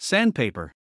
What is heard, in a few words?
SANDPAPER